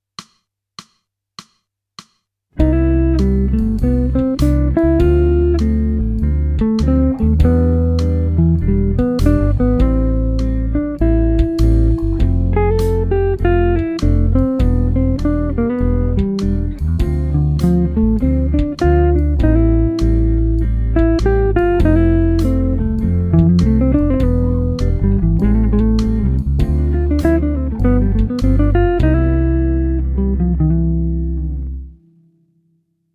jazz

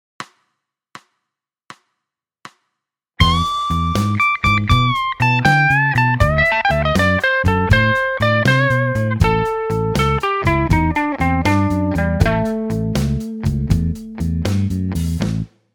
blues

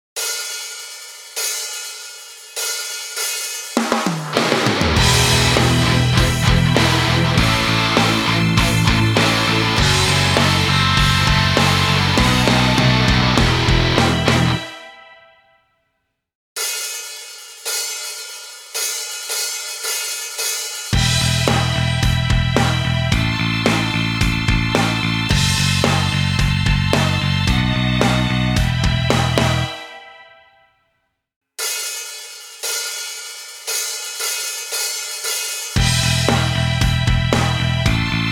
karaoke

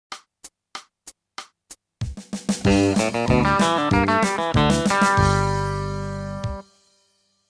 country

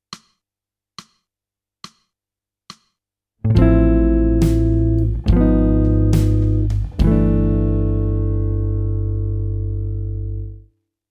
jazz

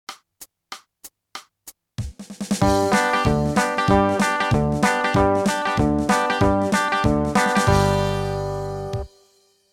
country

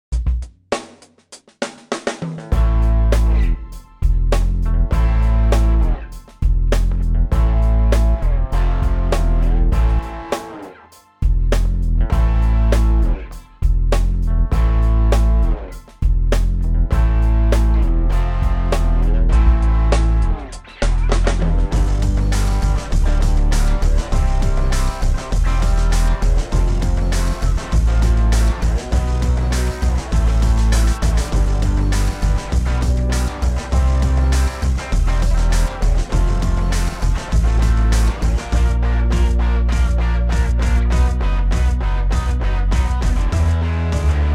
karaoke

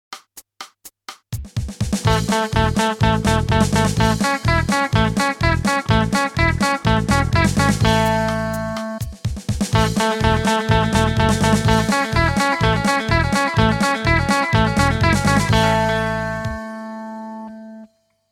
country

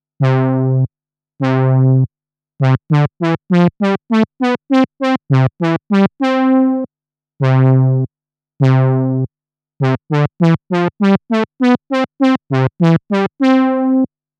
Detune, synthe

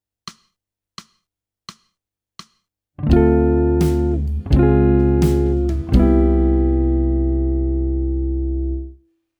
jazz

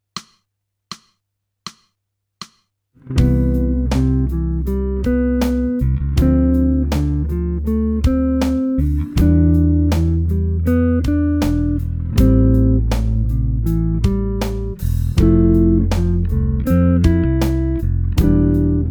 jazz

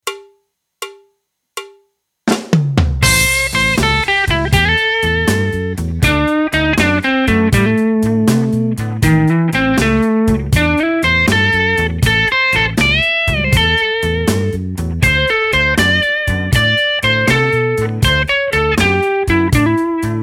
blues

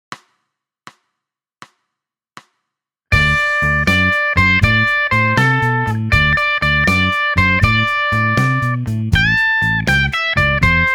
blues

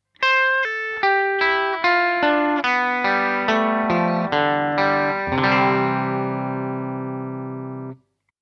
country

